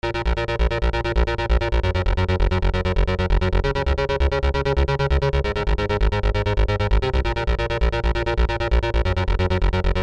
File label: main bassline at 133 bpm